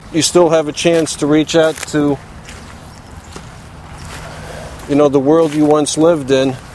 breath

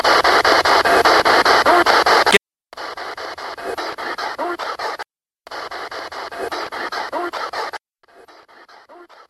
wood; Bound Brook Ghost Box #4

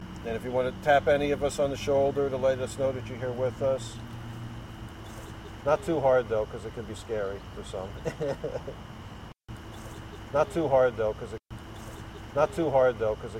Laugh; Rose Hill 5 EVP #2

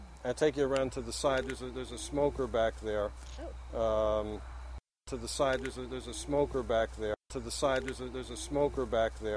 Humming; WFMU EVP #4; As I am talking, you can hear what sounds like a little girl humming in the background.

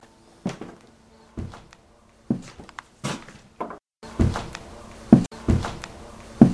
Close The Door

